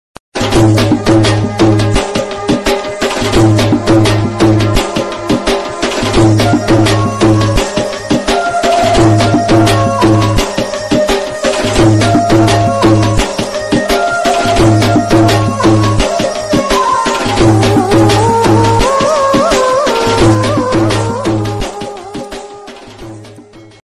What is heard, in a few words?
rajasthani theme ringtone, marwadi ringtone